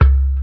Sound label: NEW ROOTS REGGAE; Bass; Sonic Foundry (Sony Creative Software); Not Just Another Pretty Bass